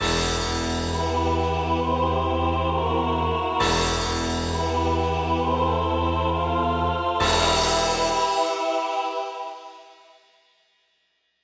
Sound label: Death Game Over; sonic epoch